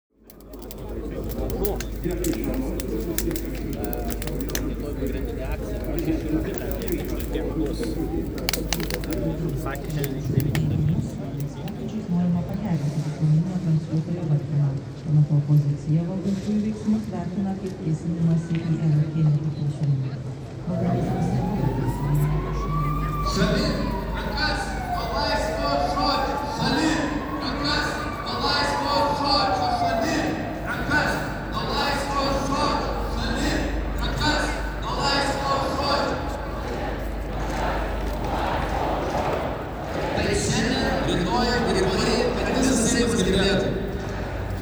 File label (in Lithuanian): protestai